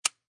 Pistol Semi Auto Dry Fire; Dry fire / misfire click; Gun Handling

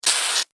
Extermination, Pesticide, Can, Spray, Insect, Bug; Sound Effects:Animals:Insects; Blastwave

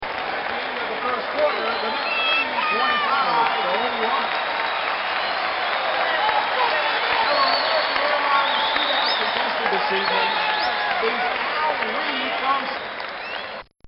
Sports Event; Sports (Sound Effects:Backgrounds)

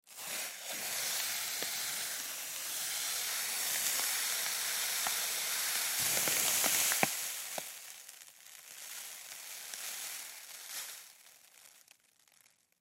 Bag Sand Pour Out, Bag Sand Pour Out Salt Sugar; Pouring; Foley; Objects; Blastwave

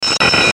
Static Scrape Stutter, Static Metal Scrape; Production Element Imaging Element Accent Transition; Blastwave; Static Sound Effects

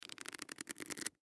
Manual Flip Through Pages Book Pamphlet; Paper; Office; Blastwave

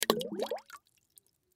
Water Splash Small Toy Bathtub; Splashes (Sound Effects:Objects); Blastwave

